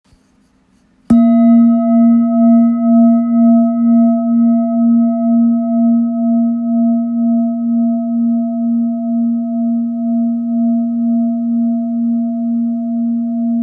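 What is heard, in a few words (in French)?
Bol therapeute re diese, bol chantant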